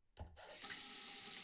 Watering Can

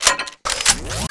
Gun Reload Scifi, Weapons Foley; SFX Kit

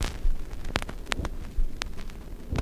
CRCK, Production Effects

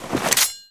Gun Draw Select Weapon, Weapons Foley; SFX Kit

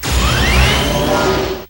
Elec Energy Charge; Energy & Forcefields

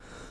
sprint Off; Apotheorasis

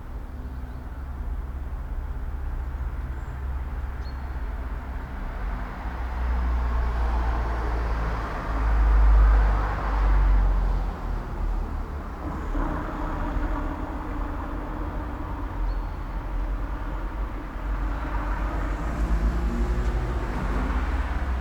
Suburban traffic light birds; urban and suburban; boom library

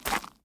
zombie footstep; DeadFright

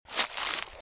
Footsteps - Bush walk - Right; Eden; RadwinIsland

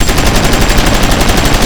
Assault Rifle Shot Loop; SFX Kit; Weapons

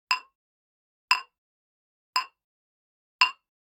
Generic Click Glass Small

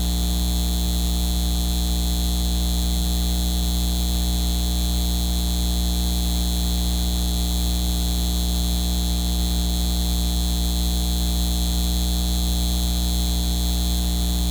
Fence - electric - high voltage; RadwinIsland

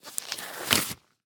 Latex Glove Take Off; Medical; Blastwave

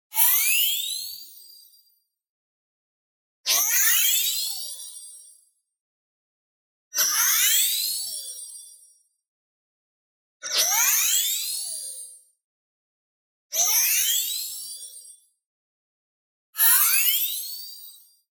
TONAL Rising Turbo; boom library; Anime Essentials

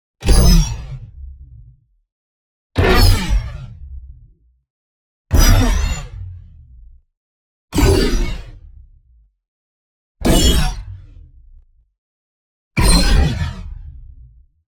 NEON ZAP Atom Spark; boom library

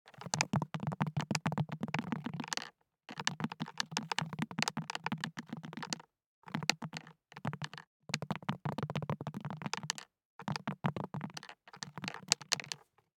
DICE in Leather Cup, Shake, 1 One Die; Samples; ArticulatedSounds; DICE Sfx Party (Extended)

